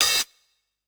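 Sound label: open hi-hat; eddyflux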